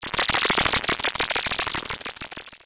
Science Fiction Sci-Fi Electronic Distorted Scanner